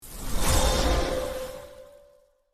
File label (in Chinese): 开始新一轮音效; xitongyinxiao